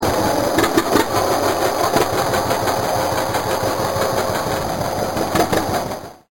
pop

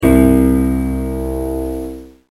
Piano Note

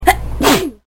sneeze

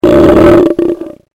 lion roar

